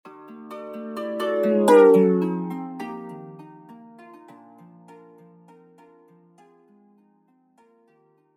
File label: doppler shifted ringtones ios